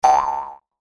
mouthharp

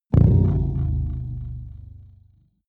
implode wobble

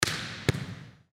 volleyball hit hard

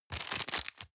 plastic bag small turn hard

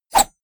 blade whoosh small

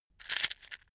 matches crunch

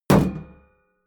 shield metal impact dent

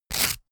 toast spread

